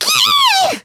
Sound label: Angry; The Lady angry ("Kyeeh!", "Eeee!")